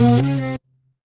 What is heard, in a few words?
retro game power up